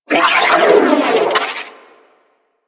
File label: Sentient Battalyst Vocal Death